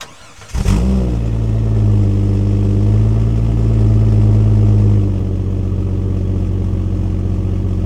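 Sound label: Truck Cherokee Start Idle Loop; SFX Kit; Trucks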